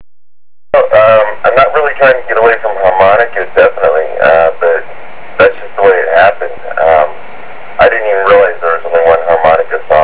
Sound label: harmonica dulcimer